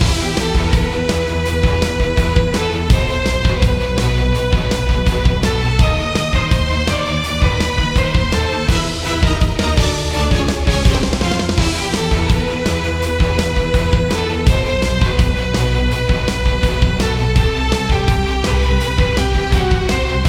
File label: Boss Battle, Loop